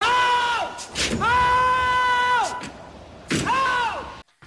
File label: screaammmm